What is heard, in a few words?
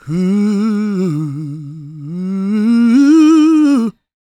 GOSP MALE, SOLO; Spectrasonics; Vocal Planet